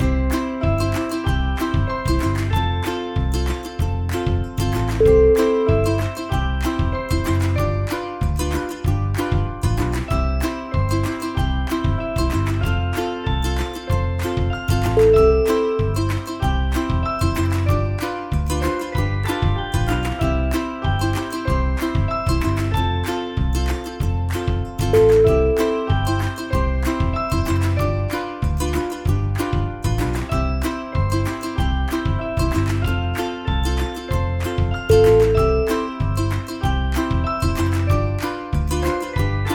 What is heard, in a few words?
ucoolele stomp